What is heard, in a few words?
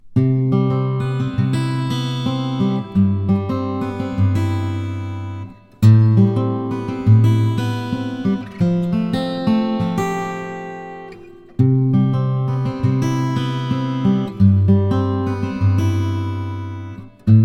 Fingers hall